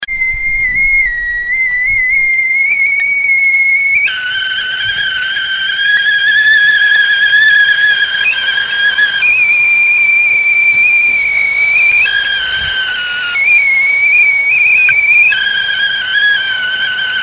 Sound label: pot whistle tea